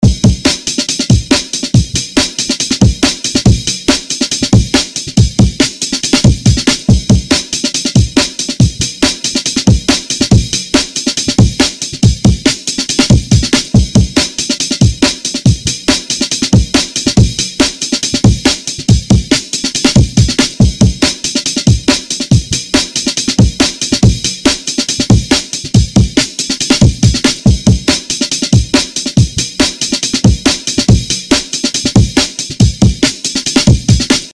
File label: drum loop DnB break beat 140 bpm